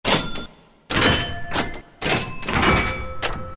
PINBALL, Roland SN-U110-02 Latin And F.X. Percussions